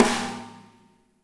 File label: MEDSNR, ROCKER KIT